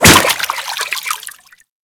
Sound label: Whip Impact Water, Weapons